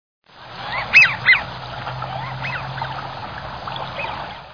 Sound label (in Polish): Świstun Amerykański; wroblowe; anseri